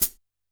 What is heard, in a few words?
G#2 Hi-Hat Pedal, Yamaha PSR-E323 Standard Kit